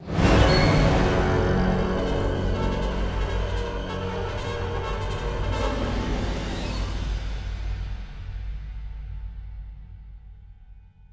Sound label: Intro Theme Stinger; Return To Castle Wolfenstein